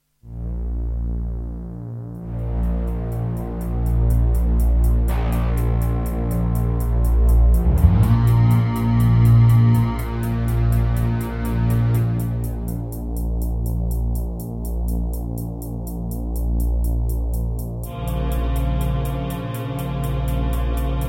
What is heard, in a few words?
Midiplus MiniEngine USB; Dune II